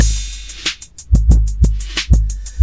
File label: reversed, Drum loops, Electro